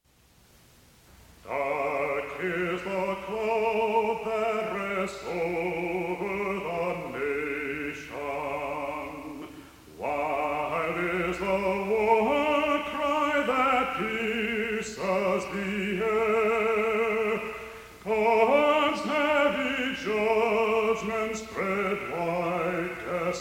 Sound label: Prayer for the Captive (Shaker hymn)